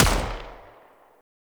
Minigun Shot; Eidolon Minigun Bombard

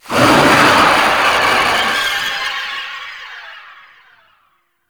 Infested Ancient Death; Warframe; Characters

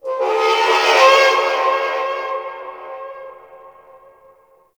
High Metal Screech; Props; Warframe; Tau; Ambience

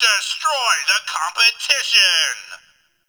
Game Bark; Atomicycle; Warframe; Ambience; NineteenNinetyNine

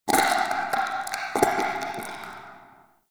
Interior Goop Distant, Infested, Ambience; Misc Indoor

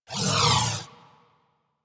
Crewship Turret Proj By, Corpus; Warframe; Rail Jack

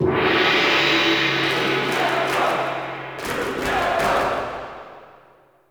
Ball Gong Hit; Warframe; Tenno Ball; Missions

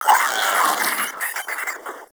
Proto Infested Maggot Awake; Warframe

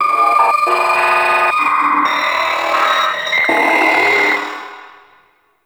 Eye Pain Cry; Ambience; Gameplay; Warframe; Orokin Moon

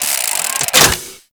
Reload; Warframe; Grineer; Ogris

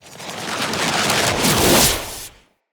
Siege Engine Cannon Projectile By; Weapons; Enemy; Artillery; Ode Siege Engine

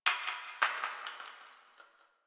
Brick Crumble

